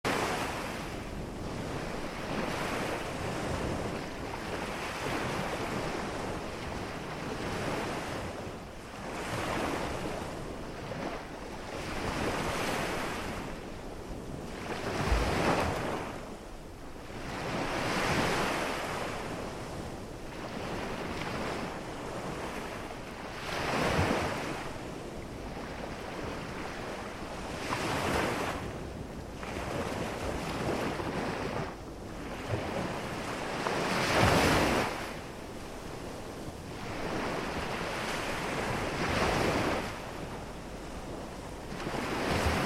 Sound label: Enthralling Island Beach Sounds